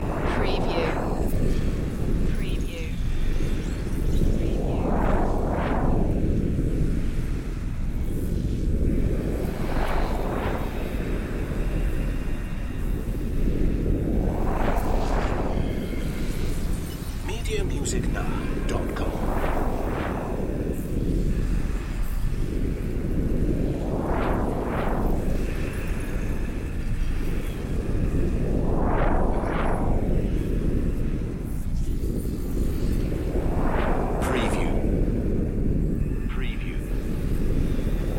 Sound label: wobbling elements ambience loop